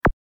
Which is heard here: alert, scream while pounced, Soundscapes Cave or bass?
alert